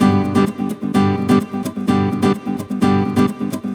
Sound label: Nylon Guitar - G maj, 128BPM; Vengeance Samples; Special Sounds; Nylon Guitar Kit